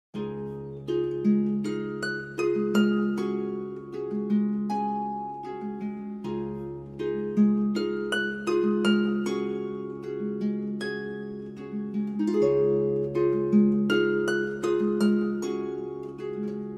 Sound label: Harp